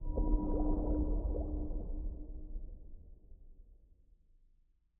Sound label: spin Start